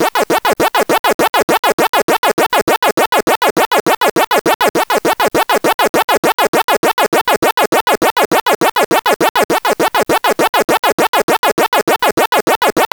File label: pulse machine; ambience